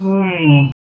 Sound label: low on money; financial